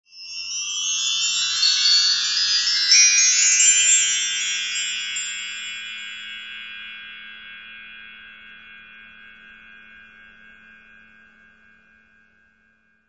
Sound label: windchimes